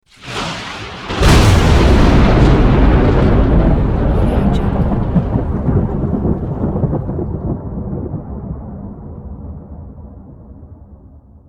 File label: lightning and thunder